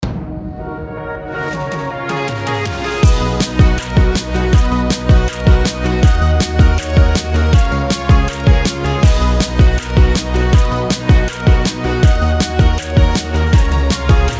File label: BED POP BMAJ 160BPM